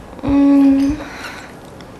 mmm enjoy

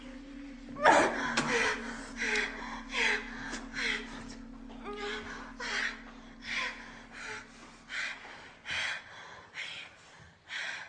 heavy

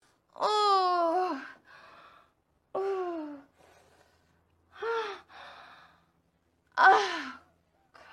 urgh

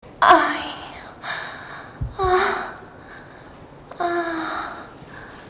ai ah argh

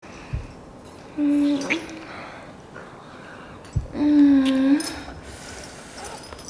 mmm

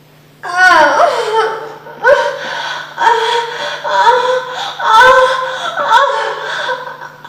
argh

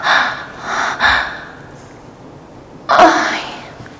aiya climax

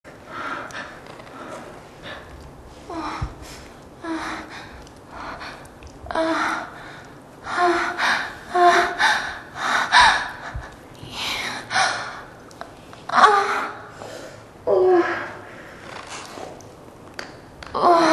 excited

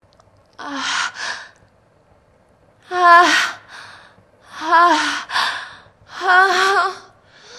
argh

